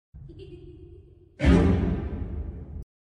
horror violin

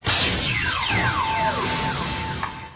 pokeball sound effects

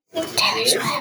classroom noises

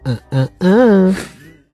hen hen hen; naughty villager

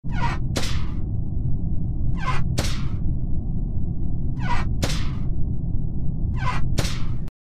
vent sound; among us

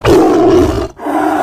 tiger roar

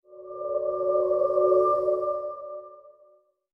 singing bowl healer tone; ancient game

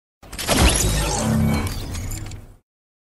death sound not knockout; fortnite

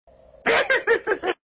laughing; yoda

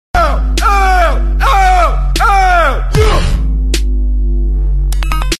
ahhhh ahh ahh yuh

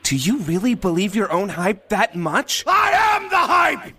hype tone

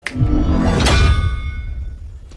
hammer

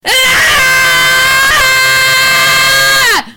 ahh

